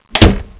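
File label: DOOR CLOSE; HOUSE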